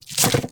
arrow return to quiver, Medieval Weapons Sound Effects